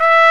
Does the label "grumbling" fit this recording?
No